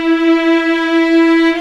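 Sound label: viola E4, ARCO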